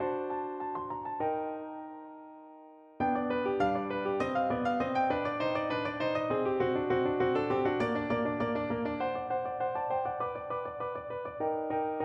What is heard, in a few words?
Cadenza